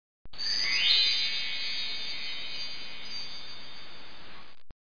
Celtic Chimes; TAROT

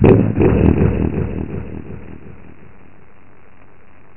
crack

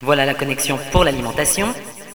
kit; Villagina; ecole

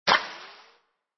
Bonus; yeti; Puzzle

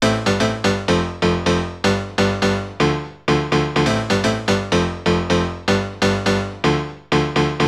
piano house 125 A; eJay; Keys

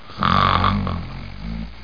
Snore

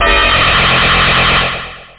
ziplock, effects, acid

